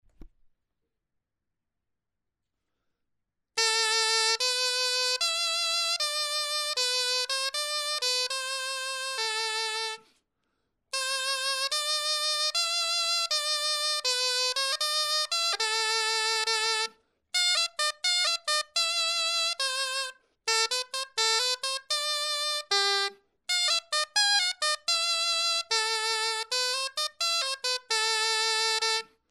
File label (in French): clarin etude